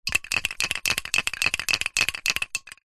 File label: leisure can silly string shake rattle bearing